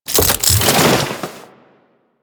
Turret Throw; Warframe; Corpus; Corpus Railjack; Admiral Agent

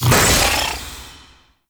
Crewship Weapon Ready; Warframe; RailJack; Corpus Crew Ship

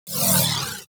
Crewship Turret Proj; Warframe; RailJack; Corpus Crew Ship

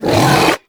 Brawler Rock Punch Cast; Warframe; Warframes

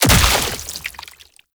Dismember Gore Impact; Warframe; Bullet Impacts

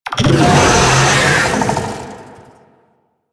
Attack, Vocals; Proto Infested Babau

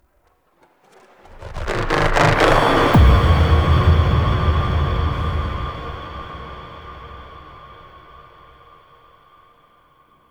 Sentient Call; Warframe; Cinematics; Mech Event

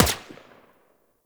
Minigun Fire; Warframe; Venus; Venus Gunner Spaceman Agent

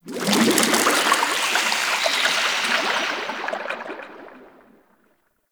Squelch Goop One Off; Props; Warframe; Tau; Ambience

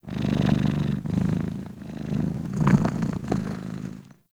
Kitten Purr; Warframe; Catbrow

